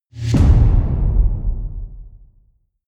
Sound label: big drums short